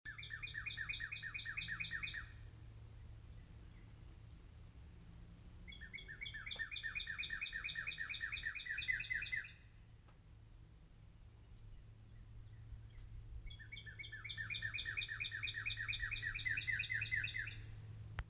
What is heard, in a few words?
bird calls